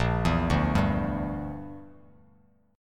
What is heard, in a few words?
Bb 6th Flat 5th Bb, Bass Standard; Bb6b5 arpeggiated